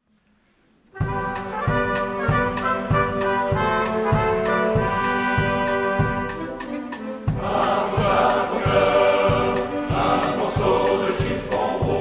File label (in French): musique